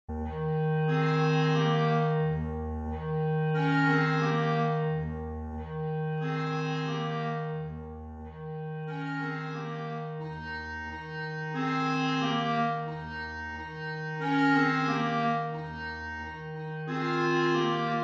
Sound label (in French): blues clarinettes